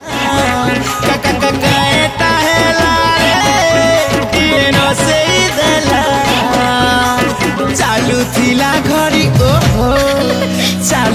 New Sambalpuri Song

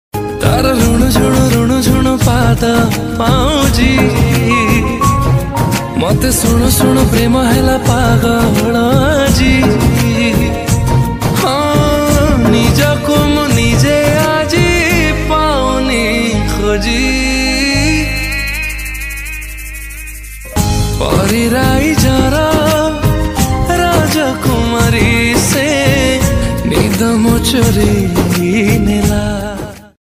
New Odia Romantic

